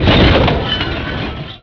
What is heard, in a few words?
crunch